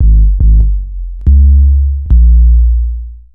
Bass, Futurist Drum & Bass

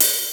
HAT

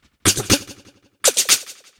Bass, Promotional Loops; Sonic Foundry (Sony Creative Software); Not Just Another Pretty Bass

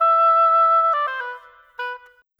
Oboe, Orchestral; Sonic Foundry (Sony Creative Software); Orchestral VOL-2; Modern 02

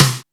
SNR RIM; Back In Time Records - Big Bang

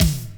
TOM, Dance Mega Drums; Best Service

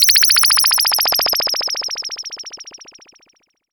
SYNTH FX

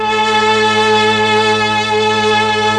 STR, STRING

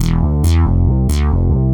bass, 138 Dmin; Big Fish Audio; Hardcore Breakbeat Action; B Files

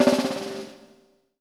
BUZZ VERB, PROC SNRS, Drumworks; Sampleheads; New York City Drumworks VOL-1

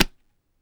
Indian Percussion Tabla; Mango Loops

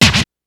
Scratch; Roland CR-80

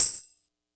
kit, Yamaha Motif-ES8

